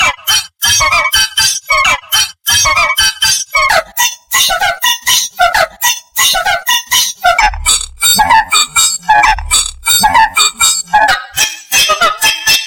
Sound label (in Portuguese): BEAT FINO; CONTEUDO CAPIXABA PARA DJS